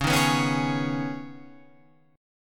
C sharp 7th Suspended 2nd, guitar chord; C#7sus2 Chord {9 6 9 6 9 9} strummed

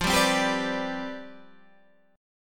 C Major 7th Suspended 2nd Suspended 4th F, chord

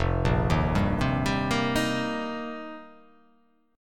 F 7th Suspended 2nd Suspended 4th

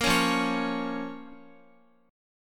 F Suspended 4th Sharp 5th F, Guitar; Fsus4#5; strummed; x,x,8,6,5,8

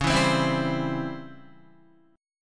Db Minor Major 7th Flat 5th Db Guitar, chord; DbmM7b5 strummed; JGuitar

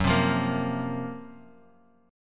F sharp Major 7th Flat 5th F sharp; 2,3,3,3,x,x; strummed

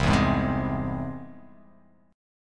C Augmented down

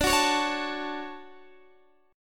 D Major 7th, D, down, Guitar DADGAD

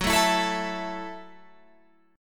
F sharp Minor 7th F sharp, Guitar Open F; F#m7 strummed; 18,16,16,16,19,16

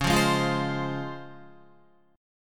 Csharp Major 7th Csharp Guitar; C#M7 strummed; 8,8,8,10,8,8; JGuitar

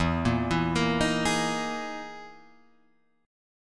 E Major 7th Flat 5th down

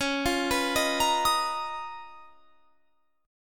Db 13th Db; arpeggiated

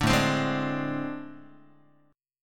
A Minor Major 7th Double Flat 5th A, Guitar; AmM7bb5 strummed; 4A,4E,5A,5D,5G#,6C#; 5,5,3,6,6,x

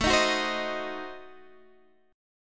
A Diminished 7th, Guitar Half Step Down

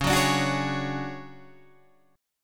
D Major Sharp 11th C sharp, chord

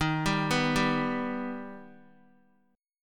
D sharp Augmented D sharp Guitar; D#+ arpeggiated; x,6,7,4,7,x; JGuitar

